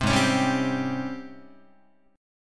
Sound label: Ab Major 11th Ab Guitar; AbM11 strummed; JGuitar